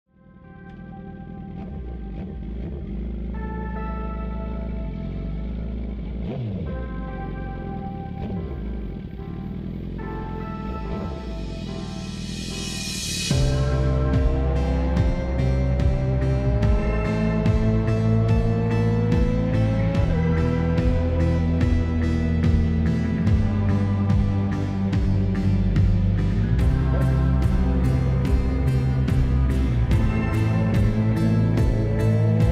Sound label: slowed and reverb